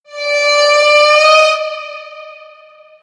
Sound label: Forest Wrap Sounds Car Horn